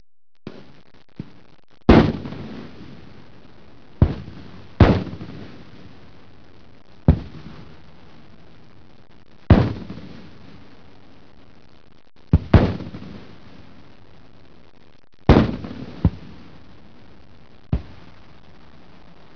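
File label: artillery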